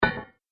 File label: Impact Hit Clang; Miscellaneous Impact Sound Effects